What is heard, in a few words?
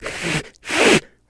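sounds cat hissing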